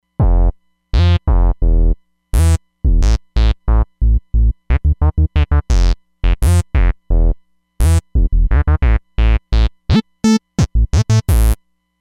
Not a Bass, Roland Fantom XR

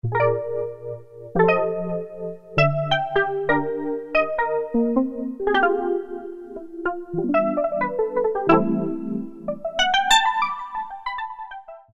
Vibe, Roland Alpha Juno-2; Factory B

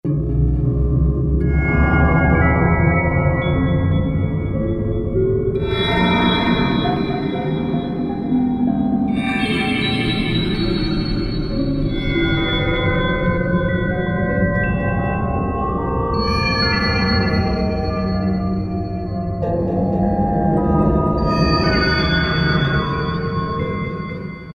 Korg N364; Factory Combi demos

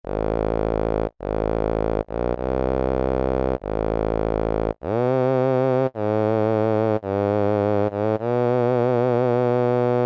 bass, Korg M-500; micro-preset

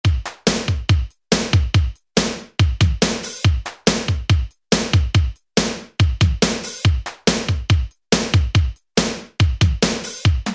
Rap, Alesis SR-16 Preset Patterns

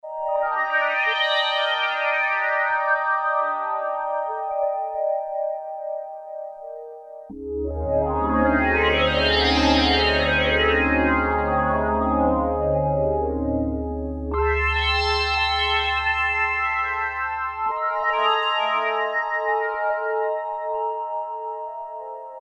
Space Sound, Roland Juno-60; Factory presets

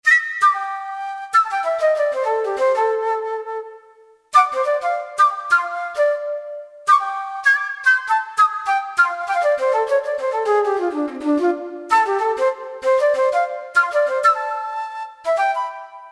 Yamaha YPT-400, Sweet Flt; Voices

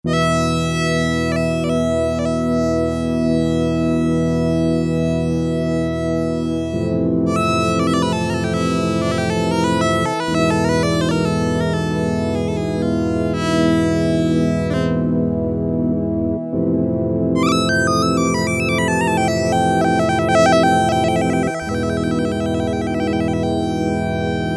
Split ROTARY ORGAN DISTORTION SOLO, Oberheim OB-8; Splits & Doubles

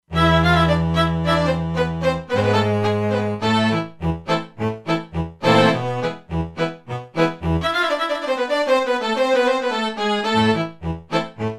Quintoctave; Factory Presets; E-mu Proteus-2

